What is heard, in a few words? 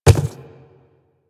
Quadruped Medium Walk Default, footsteps